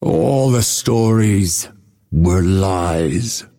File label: death; dota; phantom assassin